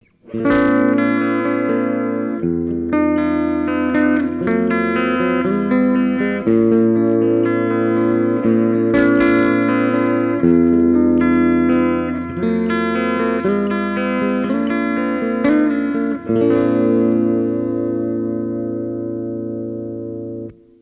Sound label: eclipse neck clean; eclipse active; tech21